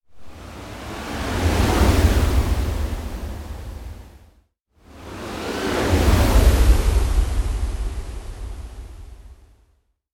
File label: Hissy Swooshes Blows